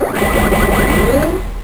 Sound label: Dragonzord Missles; Saban